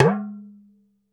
Talking Drum hi up; E-MU Producer Series Vol. 7 – Old World Instruments

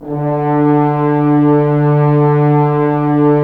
Horns; Roland - LA Composer vol. 4; HZ Horns

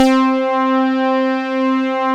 OB BASIC

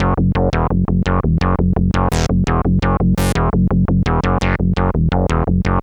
MINIMOOG; AMG - Vince Clarke Lucky Bastard

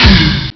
tom, Amiga Soundtracker Sample Packs

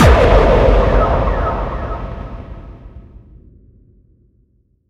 FX Kicks

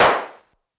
Clap Analog Lo; Lost Tapes From Mars; Clap & Rim

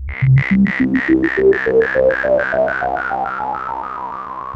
BLOOPS, DIGITAL FX; Zero-G Ambient 1

